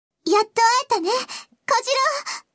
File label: alto; 明るく無邪気で天真爛漫。天衣無縫。純真無垢。